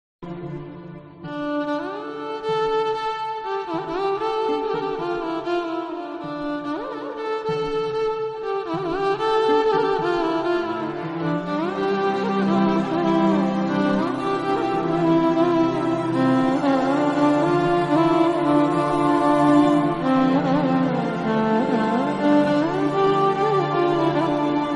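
telugu love feeling magic background music ringtone; wfl creation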